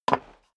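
step dress boots wood creak single stair up, footsteps; schritte; menschen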